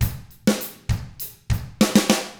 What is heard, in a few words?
ROCK, Drums; ILIO; Double Platinum Drums 1